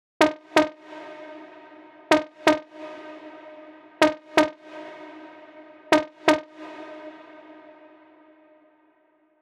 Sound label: 126BPM Lead Root D#; Vengeance Samples; Lineup Kit